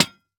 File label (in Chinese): 灯笼破坏声